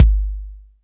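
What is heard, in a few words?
LIT BD, bass drum; LITE KITS; Crypton; DrumBase5000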